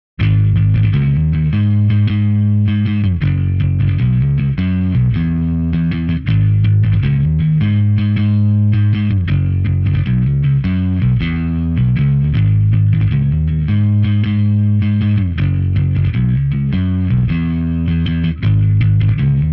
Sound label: bass